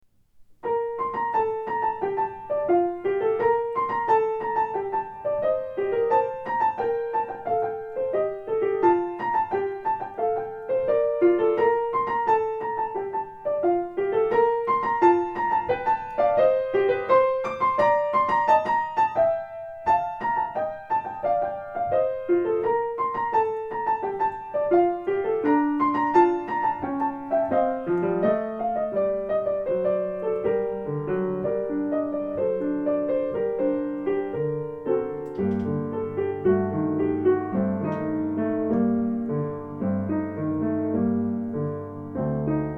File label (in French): libellule, piano